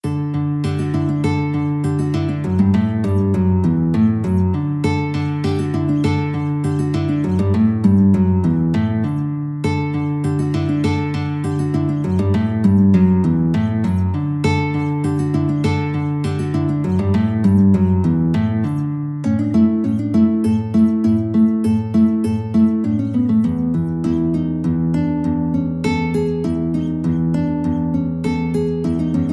musette, guitar

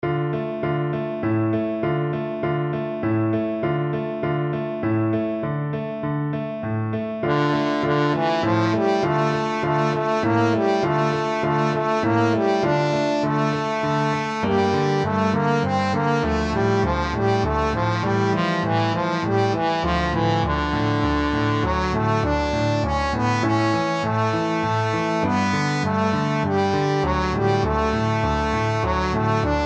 a la nanita nana, trombone

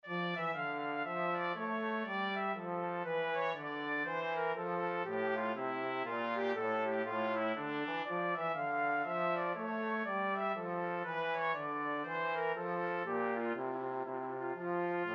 bouree 2TP 1TB, 2 trumpets trombone

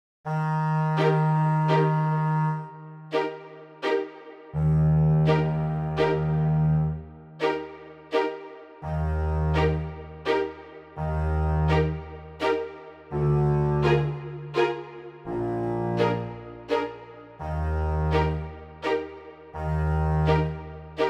saint saens elephant, double bass

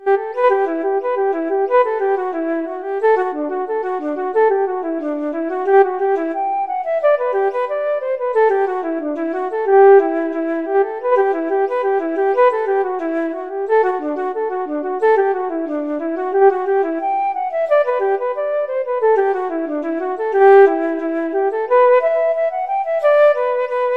flute

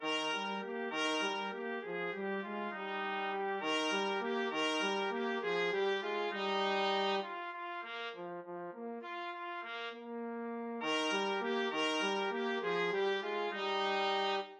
moaner trumpet trombone duet

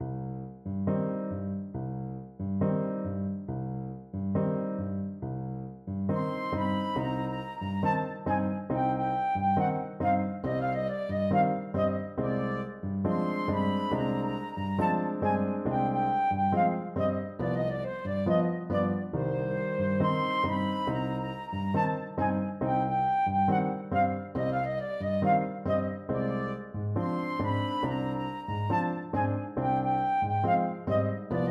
habanera, flute

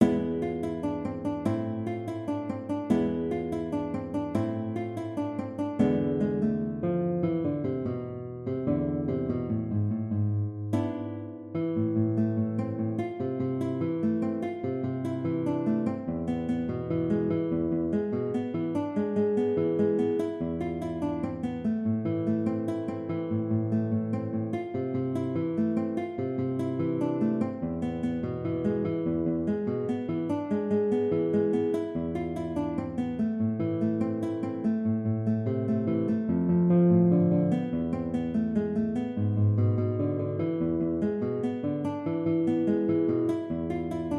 Zenska Siptarska Igra, guitar

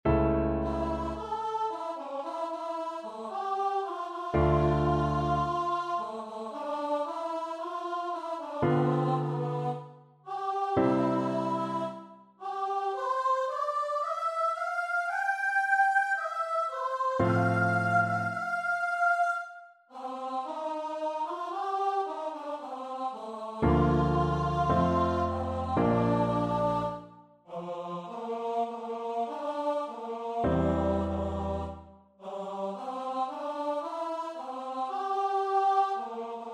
st matthew passion, choir; bach

